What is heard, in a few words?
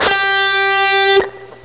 guitar (g3); Sound Effects for Multimedia - Volume 1